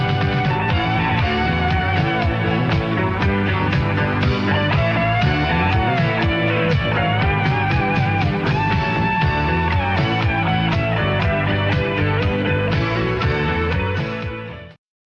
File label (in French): pianiste